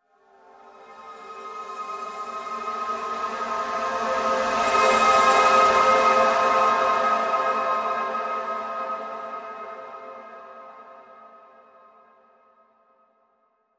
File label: Whimsical Winds Transition